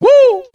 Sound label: "woo"